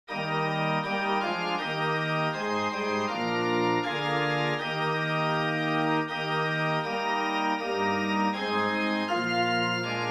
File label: Organ; Cast Thy Burden Upon the Lord